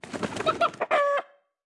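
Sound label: Chicken base, 部署音效; 母鸡; 卡通农场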